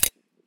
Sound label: Switch Flip